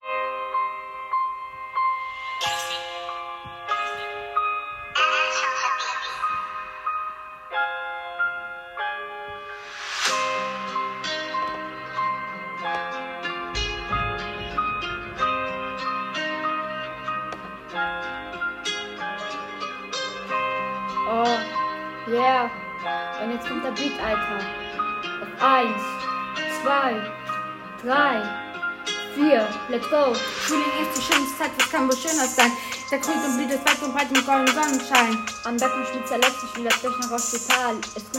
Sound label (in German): Rap